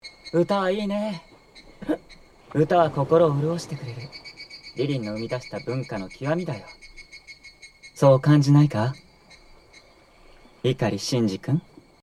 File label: song